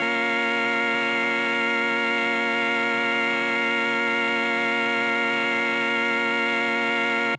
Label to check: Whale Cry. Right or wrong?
Wrong